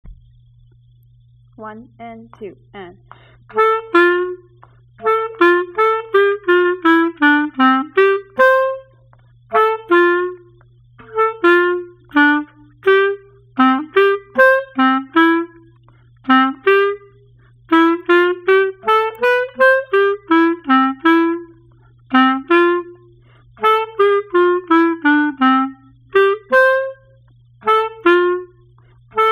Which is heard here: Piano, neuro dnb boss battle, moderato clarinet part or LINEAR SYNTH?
moderato clarinet part